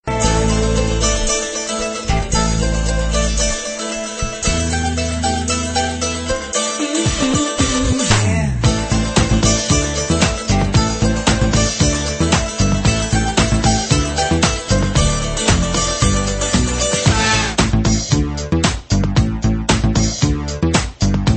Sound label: salsa